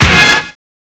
HORN; CMI Sound Library Rev 1.3; BRASS

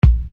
Bass Dr, EuroDance Bdrums

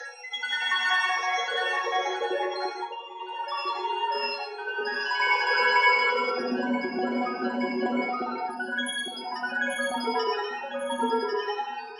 24edo 5ths, pad

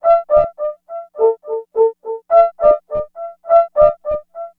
French Horn; Monkey Funk

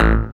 synth, Roland, dance synth; Sound & Vision - Gigapack I CD 2

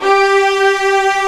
STR SEC G3; AKAI S-Series CD-ROM Sound Library VOL-1

